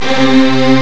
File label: strs, strings